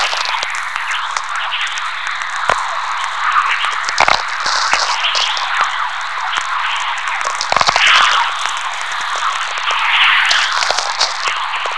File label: chorus